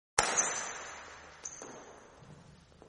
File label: badminton sound